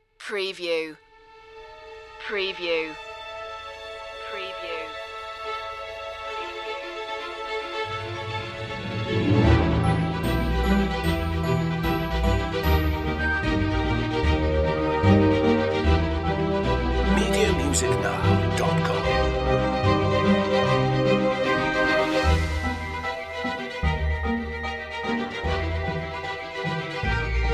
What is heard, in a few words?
magical whirlwind no choir